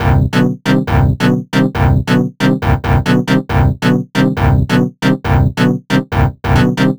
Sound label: Chicago Stab, 90’s rave & techno sounds; Rave Generator 3; 90’s Rave & Techno VST/AU/AAX/CLAP Plugin